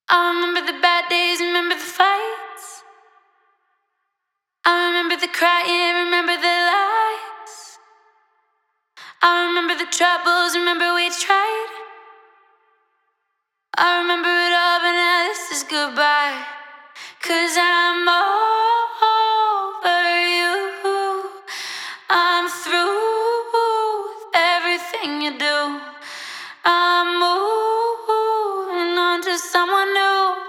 Tropical House Vocals F Major Full WET

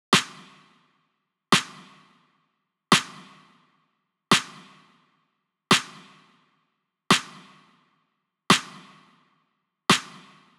Drums 86bpm Snare Loop

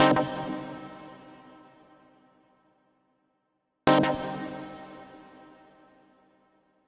Synth 124bpm C

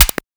Snap

